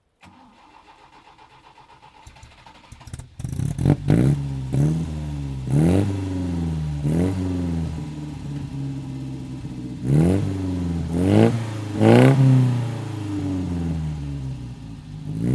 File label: Alfa Romeo 1900 Super